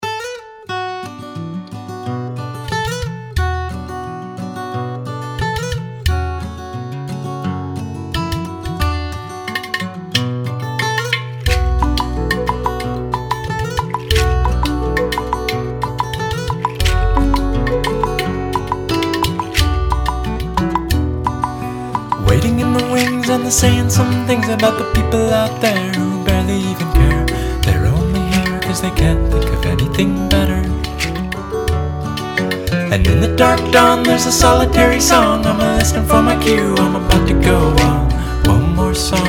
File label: audience